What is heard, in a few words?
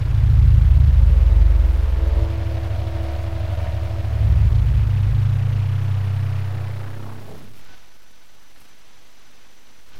sfx night bus